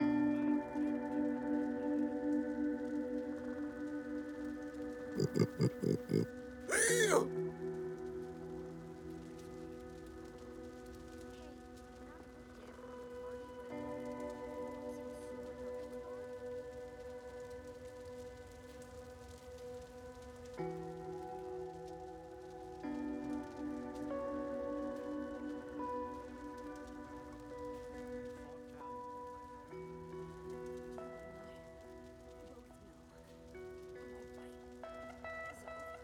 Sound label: Nether A (delayed melody guitar); mcsm music; Netflix